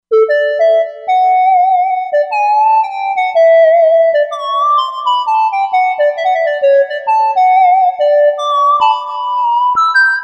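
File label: Sweet Pulse, synth lead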